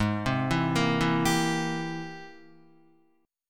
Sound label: Ab Augmented Major 9th Ab Guitar; arpeggiated; 3,3,4,3,4,3; Ab+M9; JGuitar